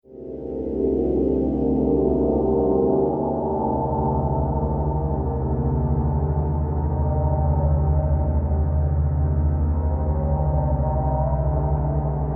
Drone Scifi Horror Underwater Tonal Scary Atmosphere; Ambient Texture Dark Ominous